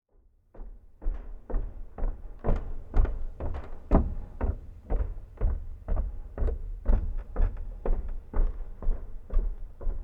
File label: Walk Below Floor; Footsteps; HauntedBloodlines; SFX Producer